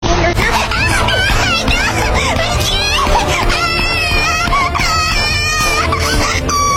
funny girl laugh

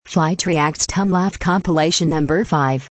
tongue laugh; susan flightreacts

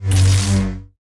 lightsaber clash

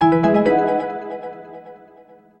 ibm startup sound

